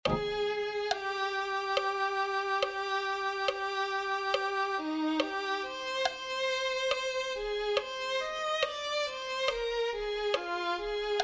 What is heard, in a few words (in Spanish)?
- violin